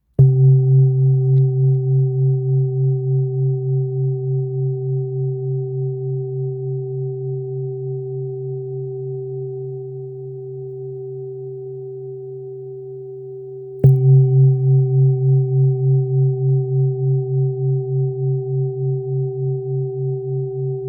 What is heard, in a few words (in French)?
Bol Tibetain Martele en Alliage 7 Metaux Therapeutique 21 cm